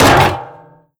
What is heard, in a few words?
vent step, headcrab reviver, creatures